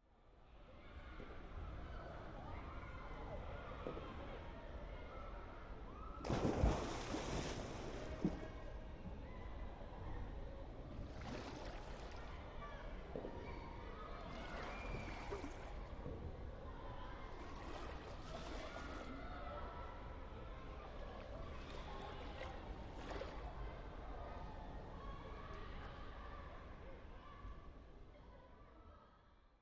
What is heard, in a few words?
Pool